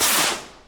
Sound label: missile launch; Risk of Rain